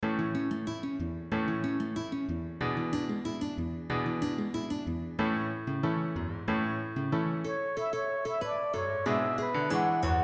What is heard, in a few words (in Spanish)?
Milonga MIDI Piano guitarra flauta